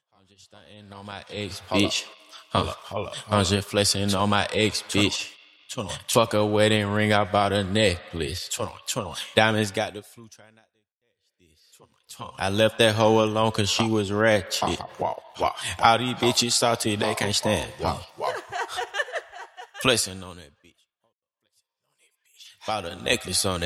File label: Acapella